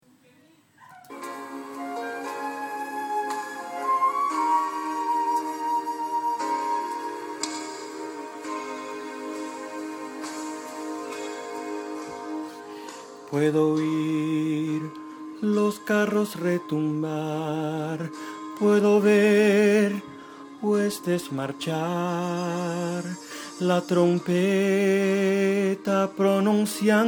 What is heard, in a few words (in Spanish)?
Solo; Vere a Cristo; Coro Juvenil; himno